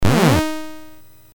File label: BASIC sid MOS6581R4, GAMES; Sword of Fargoal